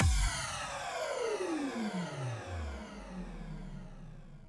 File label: 3rd Laser